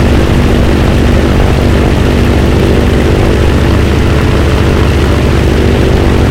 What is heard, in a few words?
b25 rear, engines; War Thunder